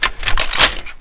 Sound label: mag in, weapons M249